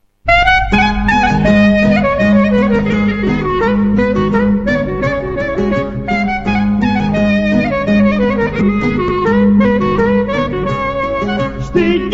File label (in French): Sirtos, Grece